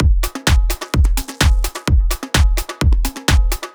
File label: Drumloops Beat 128 BPM Full